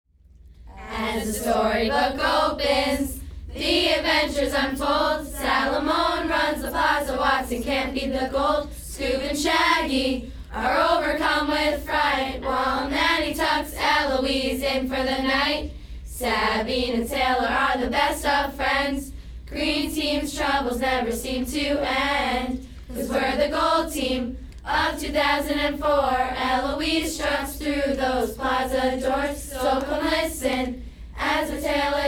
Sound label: cheers